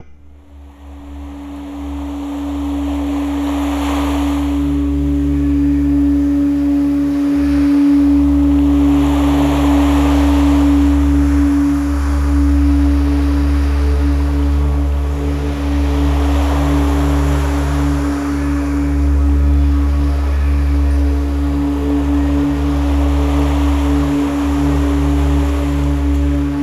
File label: Solfeggio Frequency With Ocean Sounds